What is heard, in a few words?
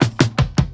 tom classic; Drums; One Shots; Slate; AURORA Sample Pack; Toms